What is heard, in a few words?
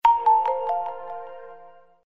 shutdown sound; Itel; S23